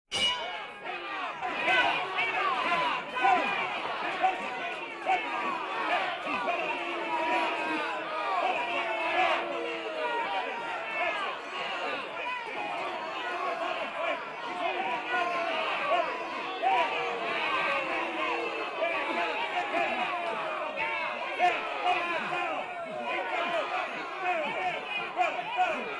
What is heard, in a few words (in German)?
boxkampf in mittelgroßer halle; Sport